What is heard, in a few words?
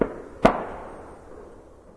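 distant gun; random amb sounds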